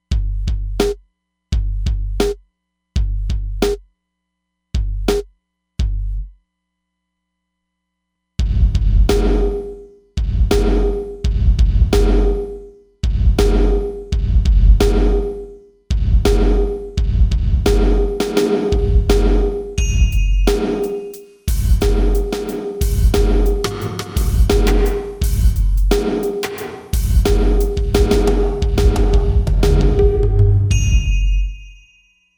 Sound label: Special Room; Roland DEP-5; Factory Patches